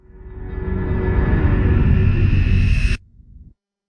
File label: Atmospheric Reverse Drone; slow motion transition